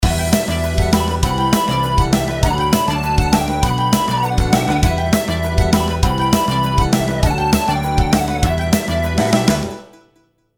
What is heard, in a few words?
delay